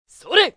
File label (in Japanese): kougeki kyou, 攻撃強, 穏やか紳士タイプ02; 上記と同コンセプトではあるけど、声質高めで若干情けない感じ。; ゲーム用フリー音声素材集